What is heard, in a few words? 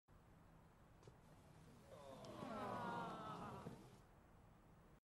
laugh track sad oh; disney